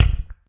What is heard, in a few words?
click; クリック05 クリック。